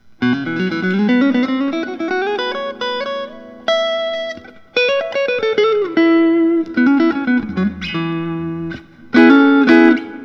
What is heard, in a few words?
grosh vintage t; blonde